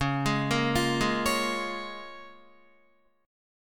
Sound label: C sharp 6th C sharp Guitar; C#6; arpeggiated; tuning=4E,4A,5D,5G,5C,6F; guitar chord